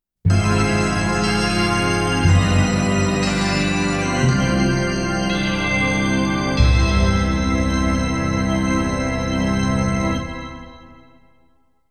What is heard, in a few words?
Final Boss Defeat Jingle; Dry Taste Kali; tokimeki memorial taisen tokkaedama poitter s point